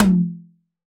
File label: TR-707 Tom 6, Roland BK-5 Toms